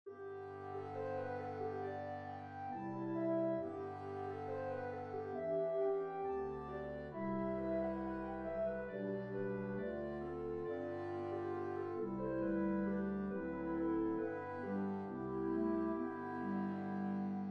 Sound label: Walking Tune Clarinet Ensemble; Grainger